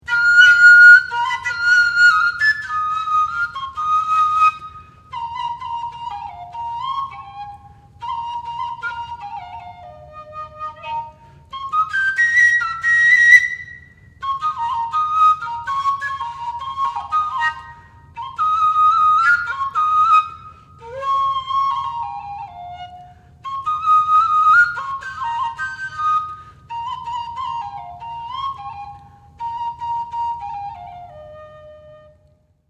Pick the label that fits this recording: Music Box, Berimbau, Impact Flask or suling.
suling